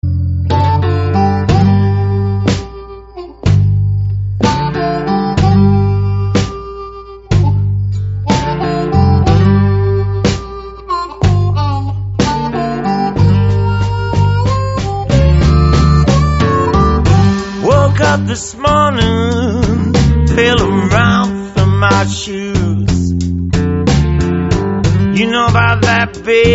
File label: walking blues